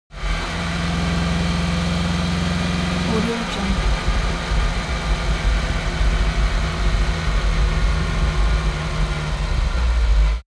transportation car dacia driving long decelerate

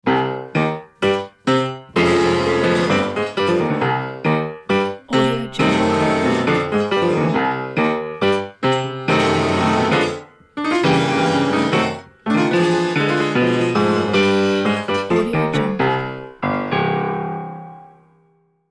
enter the villain ident

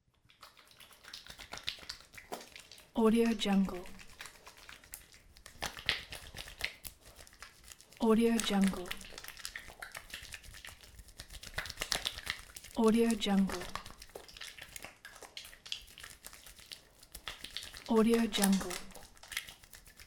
hand washing

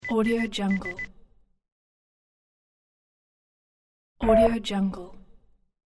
beeps; soundforces

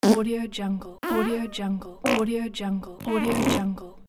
fart pack

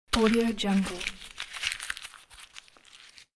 small paper sheet smashing

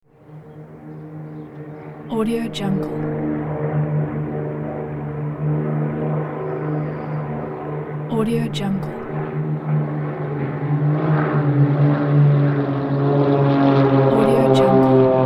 three planes pass

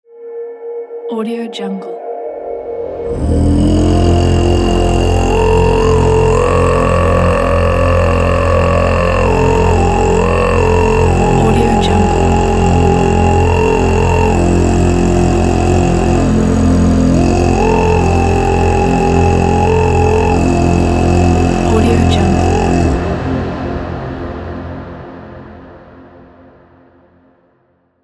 throat singing ident

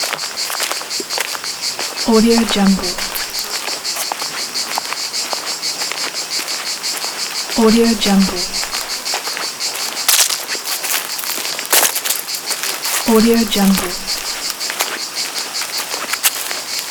summer walk in nature

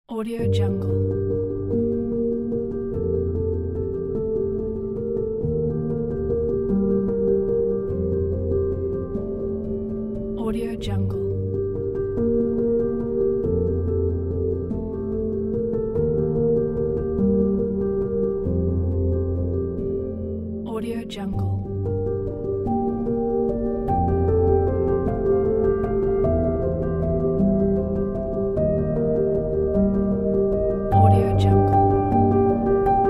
sad mood; musicalfriend

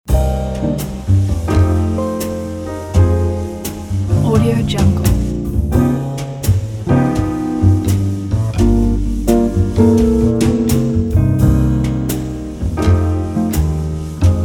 casual nightlife; alanay

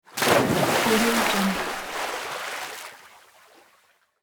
large water splash

